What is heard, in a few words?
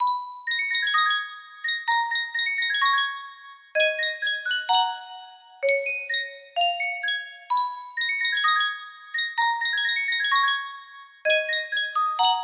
Sankyo custom tune music box, music box melody